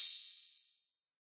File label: Roland T6 Hats; drum machines